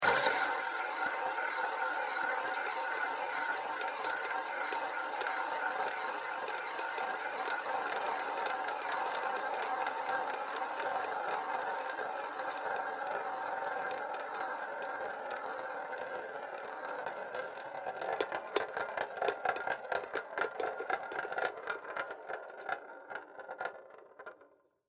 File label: roulette rolling, casino